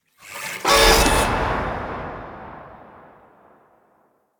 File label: Mods Station Exit; Interior; Ambience; Warframe; Nineteen Ninety Nine; Tailor Shop